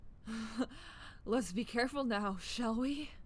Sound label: low hp